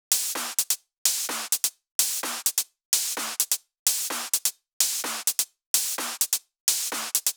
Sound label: 128BPM Emote Kit; Vengeance Samples